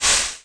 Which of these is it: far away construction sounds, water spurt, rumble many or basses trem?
water spurt